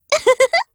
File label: weapget, ump9